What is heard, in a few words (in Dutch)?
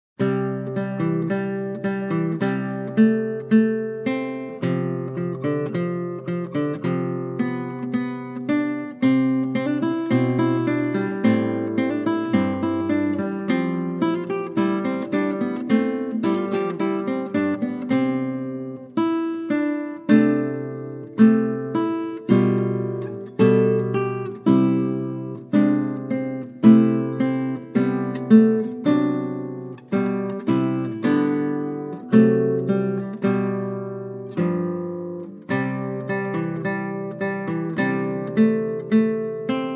Klassieke Gitaar; Bach 50 Solos